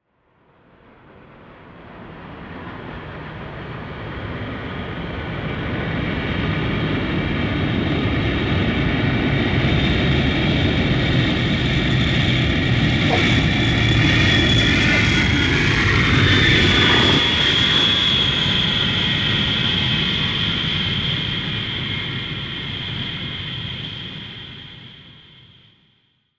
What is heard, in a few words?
jet landing nearby